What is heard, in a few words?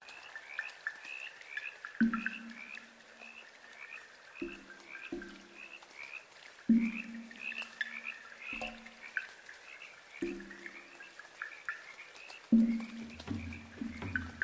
indonesian frog